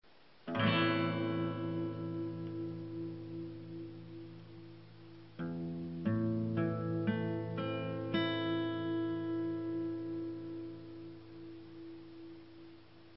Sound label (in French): Accord Am